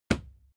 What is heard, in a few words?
fight impact sweetener cloth muffled; nahkampf; soundpool